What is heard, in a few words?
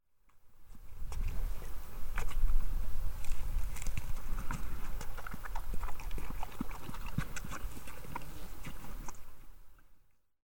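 grizzly, Yellowstone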